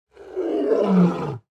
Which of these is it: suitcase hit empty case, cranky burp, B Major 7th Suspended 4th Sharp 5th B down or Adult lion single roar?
Adult lion single roar